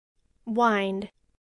wind; to twist and turn

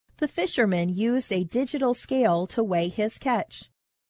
scale

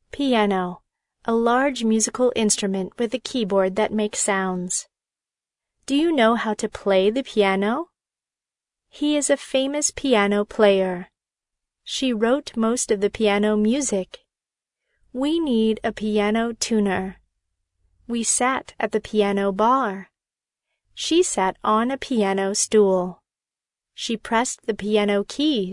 piano

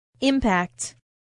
impact

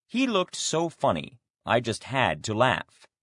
laugh; He looked so funny I just had to laugh.

